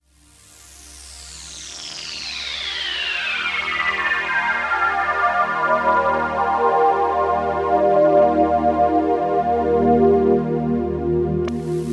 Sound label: Synthex pad, synthesizer